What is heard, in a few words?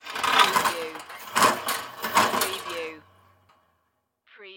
Fireplace Curtain